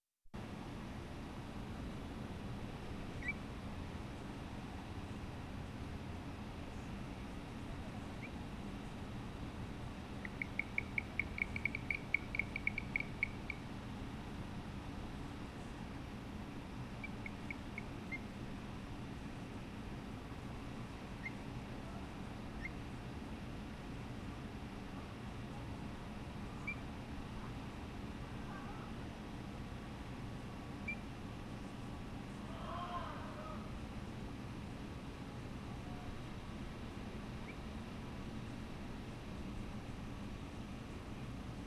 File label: Pristimantis bogotensis